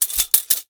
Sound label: Tape Measure; Tools; 1001 Sound Effects